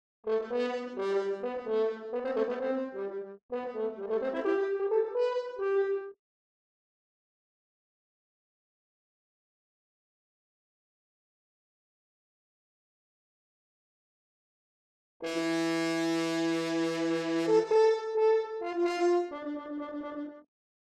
Horn

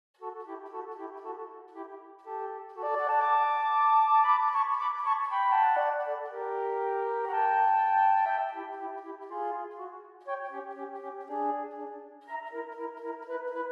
Dance of the Reed Pipes; Nutcracker Suite

